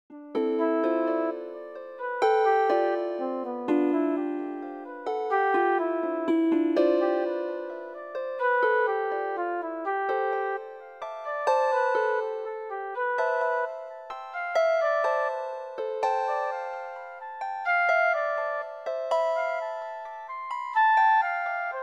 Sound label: flute and harp chords; custom voice polyphony; hocketting